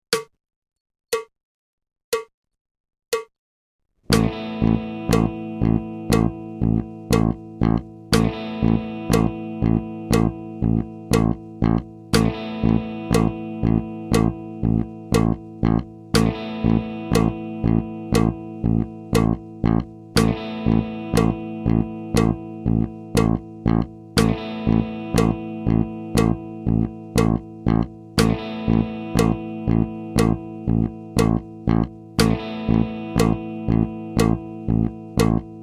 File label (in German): Time Track Achtel, schlagzeug